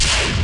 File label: Woosh